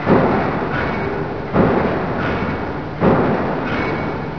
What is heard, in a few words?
hammer; source engine; hl1; video games